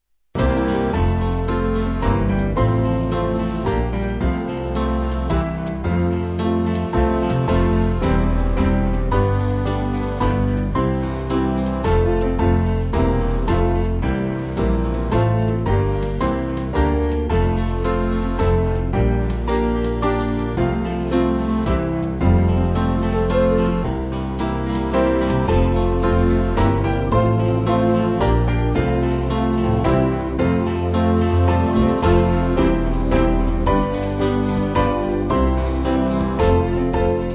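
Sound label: Band, Music Backing; The Lord's My Shepherd; Crimond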